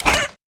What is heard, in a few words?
coin